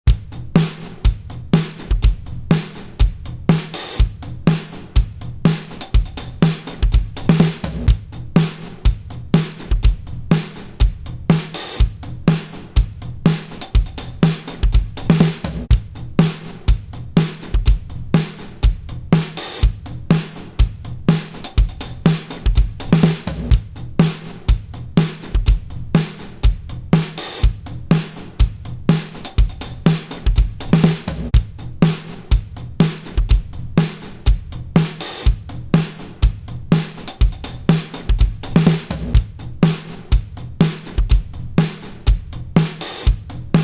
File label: Disco Drums active